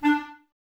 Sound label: Clarinets, Wood Winds